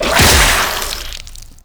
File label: Golem Gun Spit Attack Fire; Warframe; Plains